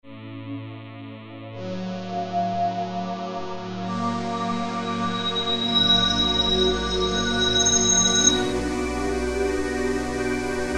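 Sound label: Atmosphere Nova Arp, E-mu Morpheus; Factory Presets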